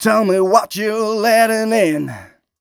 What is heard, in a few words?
m 127 mel a, Vocals